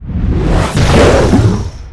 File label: skill shot fire